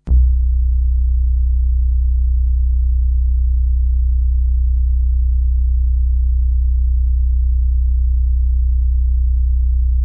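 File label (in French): infragrave nappes statiques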